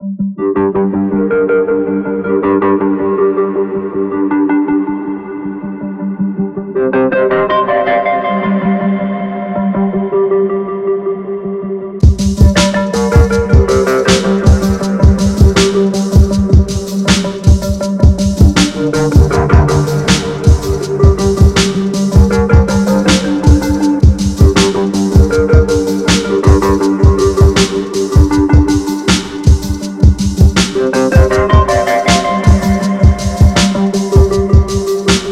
distortion

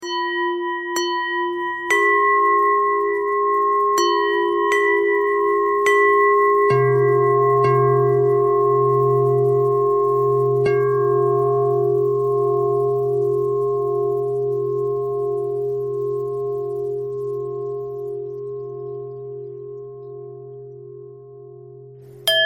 bell bell thread noise

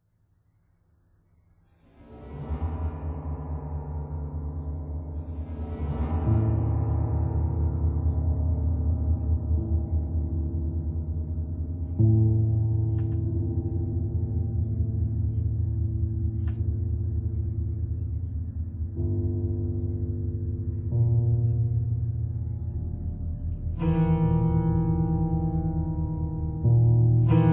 piano noise

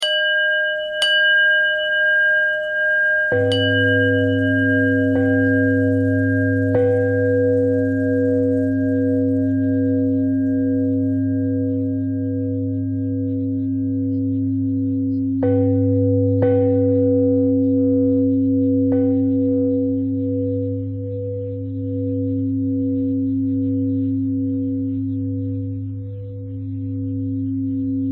bell bell thread simple, bells

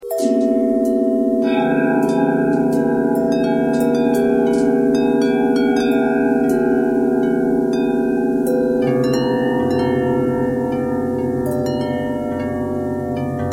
bell bendtwist

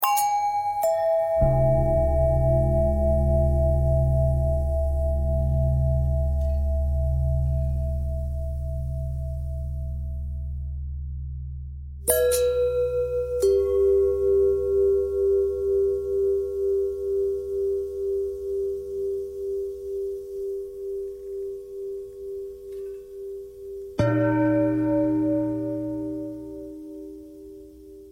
bell long bell thread pentatonic

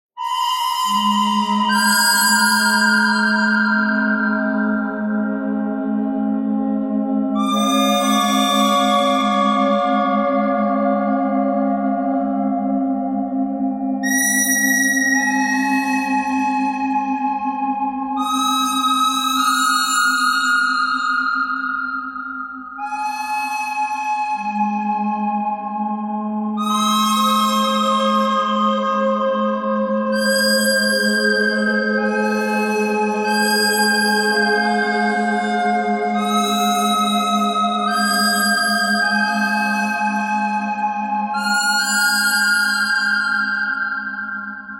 bird thread harmonic

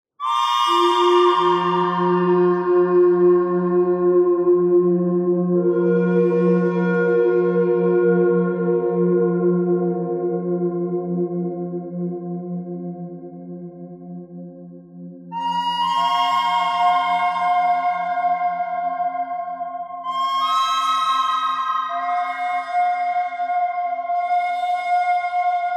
bird thread

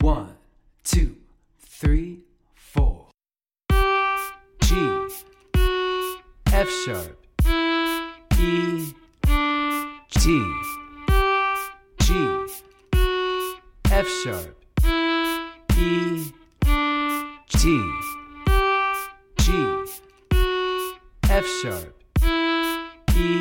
note name game, fiddle

